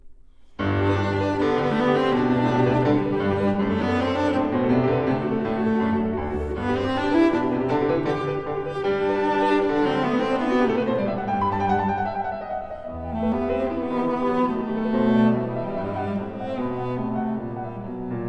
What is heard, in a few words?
Variations for cello & piano in G major